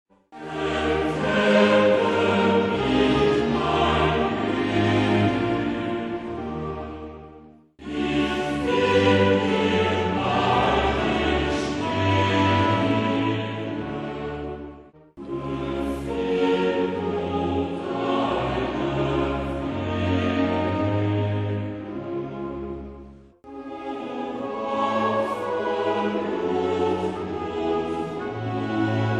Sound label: melodie; bach; O Haupt vol Blut